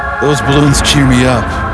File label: balloons cheer me up